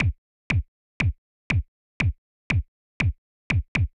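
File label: Plastic BD4, bass drum; hydrogen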